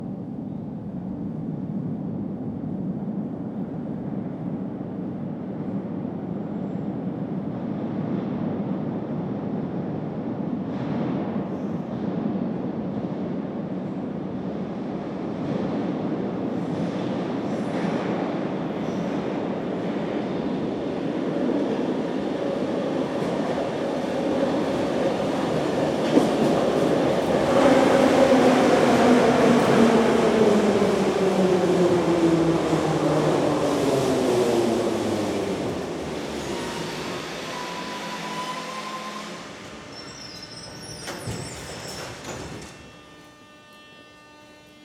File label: train arrive departure, SUBWAY; AKG